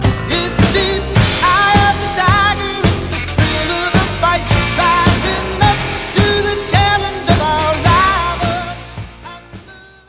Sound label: win, music